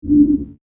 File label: Slow Low Whoosh